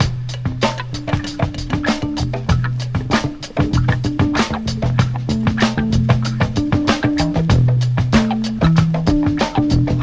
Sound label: 96 bpm fresh breakbeat e key, kick tuned to the E note